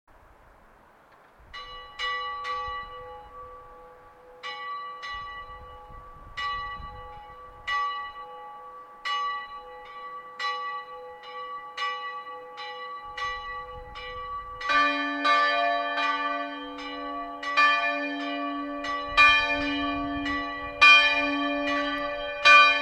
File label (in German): Glockengeläute Pfarrkirche; Freienbach